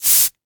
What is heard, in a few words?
Hydraulics; 1001 Sound Effects